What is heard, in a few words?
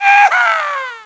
m1887 shoot, weapons; xmas